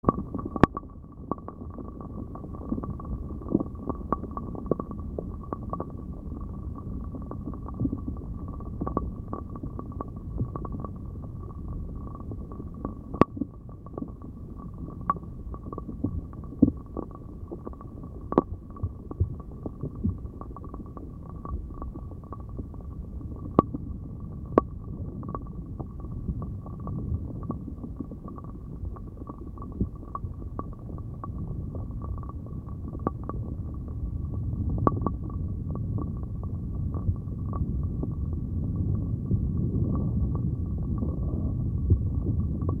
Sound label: filter off